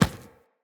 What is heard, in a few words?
Infested Medium Run Default, Footsteps